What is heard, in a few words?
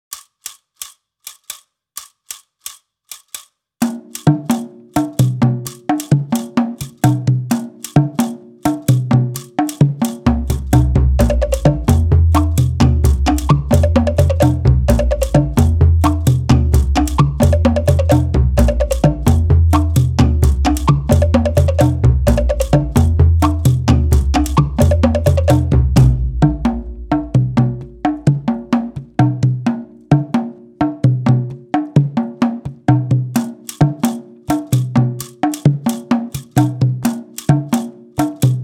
Loop Rumba; polymusic